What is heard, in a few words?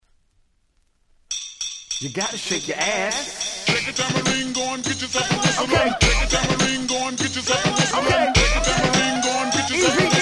Tambourine